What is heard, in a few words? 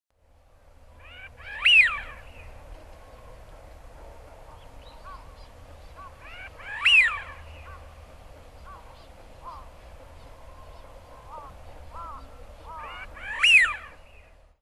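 hidorigamo, Eurasian Wigeon; hyogo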